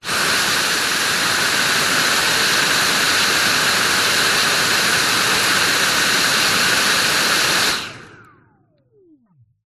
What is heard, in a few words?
household vacuum cleaner stick suction burst close to end of open hose; dyson v7